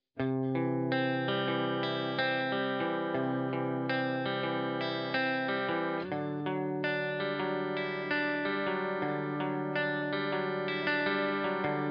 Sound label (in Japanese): フロント クリア